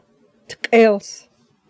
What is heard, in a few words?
fart